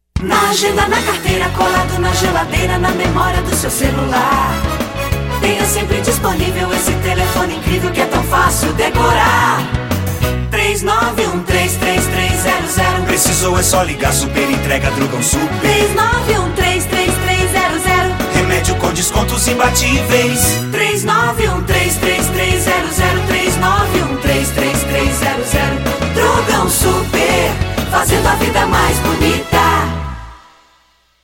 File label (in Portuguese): jingles